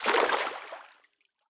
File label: stag footstep water hard; Rust